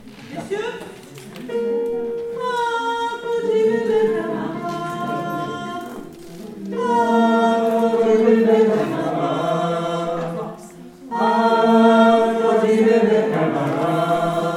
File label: Fin tenors